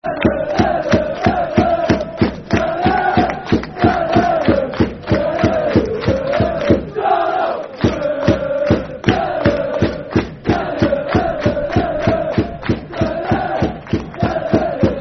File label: Football Chant, Darlington; Darlington Dfc